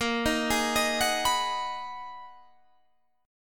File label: Ab Minor 7th; Abm7/Bb; arpeggiated